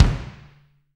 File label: BD Real